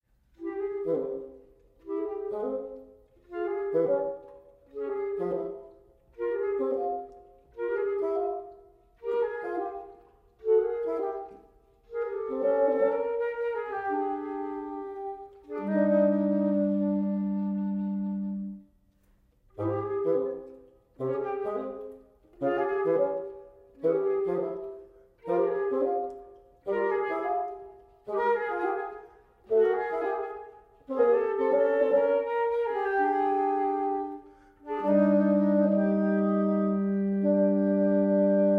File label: wind quintet allegro